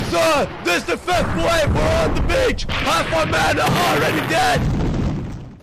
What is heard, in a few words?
Allies Wave; Empires; D-Day